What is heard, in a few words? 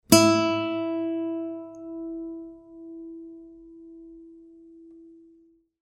String (Mi E), guitar